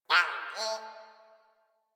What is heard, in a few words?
flying robot voice